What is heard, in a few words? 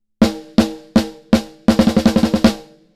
snare bypass